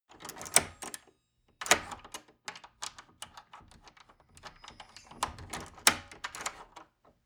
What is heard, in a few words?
wood door old lock handling ring bells